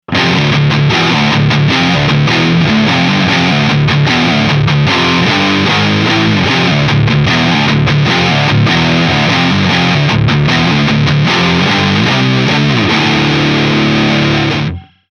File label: Eleven Rack SL 100 Drive